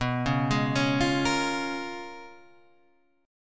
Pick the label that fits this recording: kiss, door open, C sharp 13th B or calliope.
C sharp 13th B